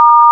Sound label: Dtmf Star; 300ms